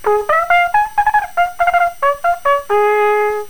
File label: with mouthpiece; brass